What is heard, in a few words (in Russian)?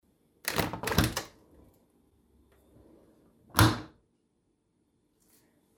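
дверца встроенной посудомоечной машинки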